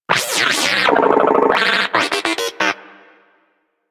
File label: Moa Inquisitive Vocal Cold Shiver, Pets; Warframe